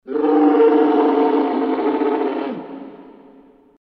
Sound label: Creature bellow